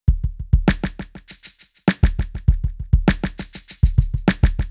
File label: Drum Effect Delay